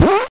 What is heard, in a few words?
squeaker; funny; Wired for Sound Pro CD; Aristosoft, Inc.